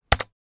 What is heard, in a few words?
enter button